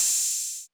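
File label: Open Hi-Hat 808 Saturated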